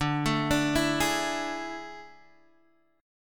A Major 7th Suspended 4th D

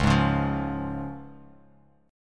C sharp Major, Bass Standard; 9,11,11,10; strummed; JGuitar

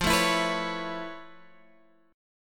A Major Add 9th F Guitar Standard, chord

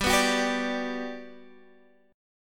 G Major 7th, G, down, Guitar, Standard; strummed; JGuitar

